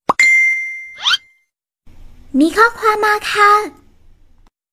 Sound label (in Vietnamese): am thanh thong bao messenger anime